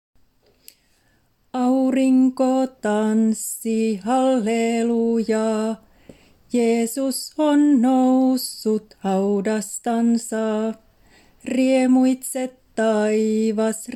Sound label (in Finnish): Virsi Stemmanauha Tenori